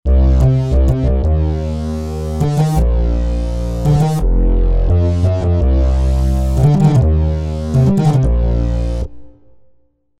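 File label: Bass Massive FM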